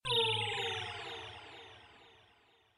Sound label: heal; flOw